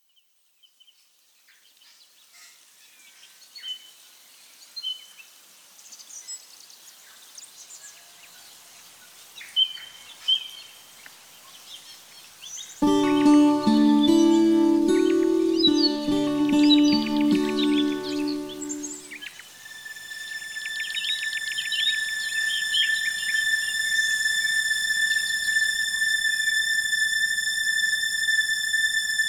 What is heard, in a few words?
strings